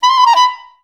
B4, orchestra; Best Service; Peter Siedlaczek's Advanced Orchestra

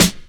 SD HOU, Dance Mega Drums; Best Service

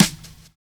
BRUSHING; Univers Sons

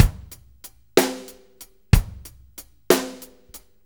6-8 Loop, Full Kit Loops; Drum Tools

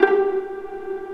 Vla Piz G3; Digital Sound Factory Virtuoso

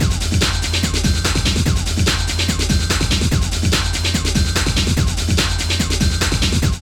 LOOP, Tekno Industrial; East West

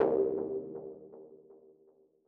M Mono DBeat C2 V127; KG MegaSaur

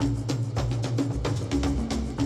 drum loop, 100 BPM; Best Service; XXL 1500 DrumLoops; Sample CDs

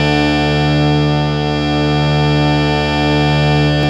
HARMONIUM, World Instruments

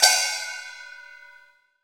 prc F, Ambi Perc, Chillout; Best Service ProSamples vol.36 - Chillout

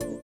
VIBRA; Spectrasonics; Metamorphosis

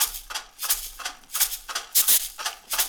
Roots Reggae; Sounds Good - Roots Reggae

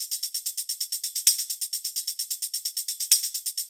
Live Percussion, 130 BPM

